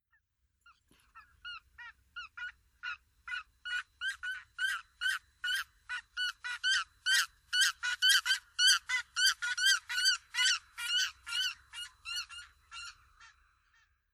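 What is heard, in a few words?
Lesser White-fronted Goose; カリガネ; 飛翔; 宮城県登米; 簗川堅治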